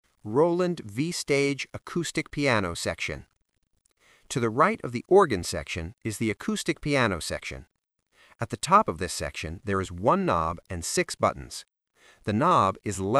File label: Acoustic Piano Section